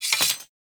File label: Putdown Sword; Warframe; Cephalon Weave